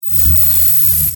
Electric Discharge, Magnetic, Science Fiction; Sci Fi Sound Effects; Blast Wave

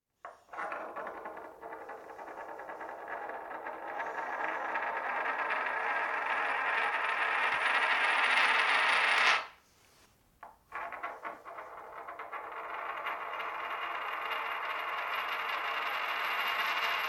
Coin Spinning on Hard Surface Various spins; Objects

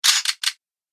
Loading Space Gun Bullets, laser gun sound effect; Andrew Fleming

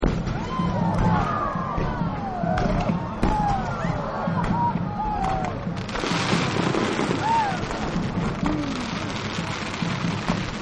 Fireworks; Fireworks Ozzie Style; Chinese New Year; Partners In Rhyme

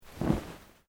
Clothing Kimono Sleeve Move; Movemement sounds for various articles of clothing; Dressing; Human

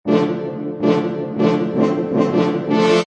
Frenchorns Dm, French horns loop Dm 160 bpm.; Woodwind Loops

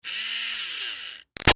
Electric Motor Small Run Short; Machines; Big Room Sound

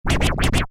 Scratch, Record, DJ; Musical

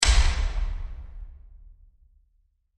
Massive Wood Impact; Blasts (Sound Effects:Impacts)

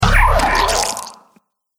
Laser Blaster Rips Alien Monster Flesh; Laser Gun Sound Effects

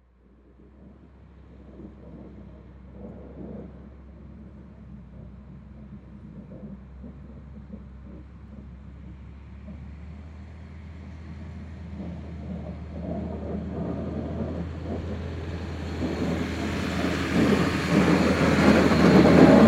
Salt Truck Drive By Snow Plow; Snowplows; Industry

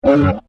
Monster Grunt, Horror, Monster, Grunt, Creature

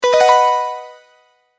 multimedia tone advance

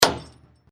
Metal Clanks, Clink from metal impact with metal; Can be tools, hammer on anvil, chain metal clinks, hit metal, etc.; Clank Sound Effects

